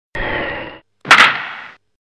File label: Baseball Bat Wood